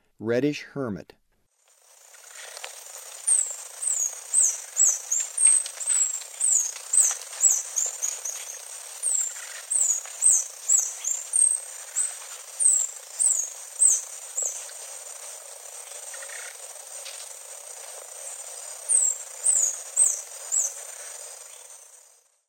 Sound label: Reddish Hermit; S. America; Eastern Lowlands